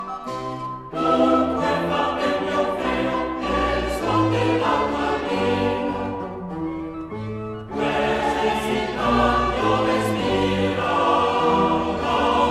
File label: monteverdi chorus